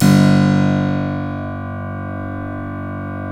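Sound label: G 0 clavinet, Keyboards Acoustic; USB Soundscan vol.17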